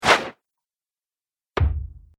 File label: Dagger Throw Distant Hit; Knife Sound Effects; combat